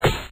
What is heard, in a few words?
door